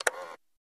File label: Thread Mechanism, dat machine